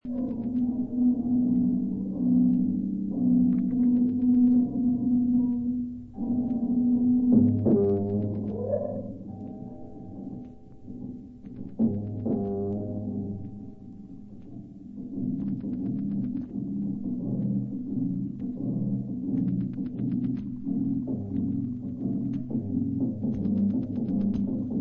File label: slowscan